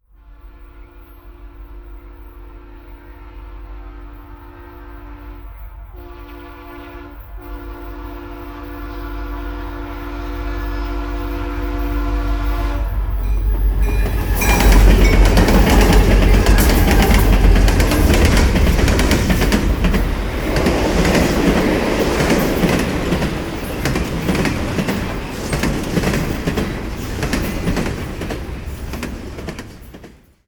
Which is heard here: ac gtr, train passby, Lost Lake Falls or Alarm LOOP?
train passby